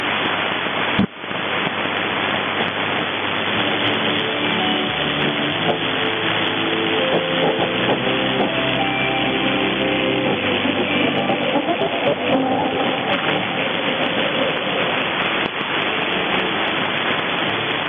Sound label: 9453 kHz AF 9450 kHz; HDSDR; GITAG No2 Episode XX